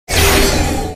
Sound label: deathly dart effect; abilities